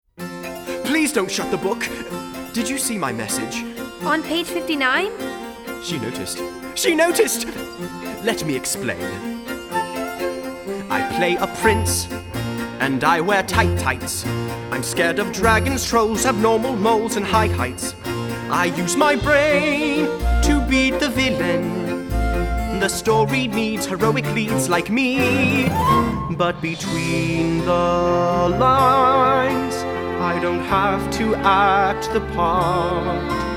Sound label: Guide Vocals; Audition Cut